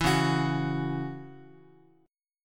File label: C sharp Minor Double Flat 5th, Guitar; C#mbb5; strummed; JGuitar